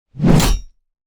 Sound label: basson hit